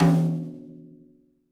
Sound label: TD-20 Tom A, Roland TD-20 Toms